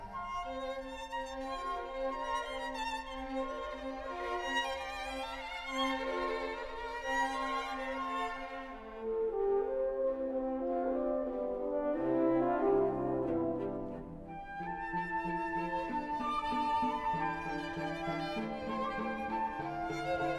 Pulcinella, ballet with song, orchestra, Andantino